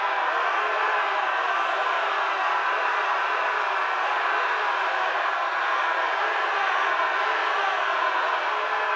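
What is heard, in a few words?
soldier charge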